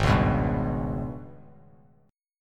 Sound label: Ab Minor Major 7th Double Flat 5th, down, Bass Standard; AbmM7bb5; strummed